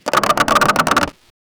cartoon box sound destroy

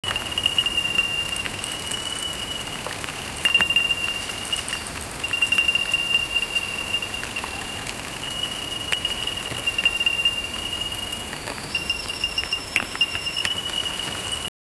the sound of a japanese, garden

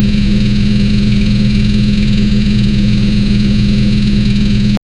looping sound of a runnin, low rpm

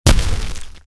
explode

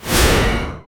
a shadow spell cast effect, magic

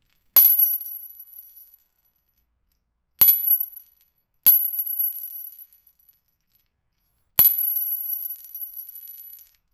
deep sound of a heavy, chain

